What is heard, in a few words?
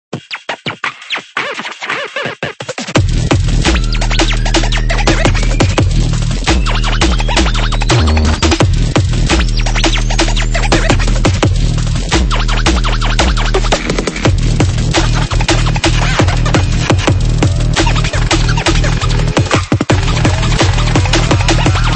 Dj Scratched Samples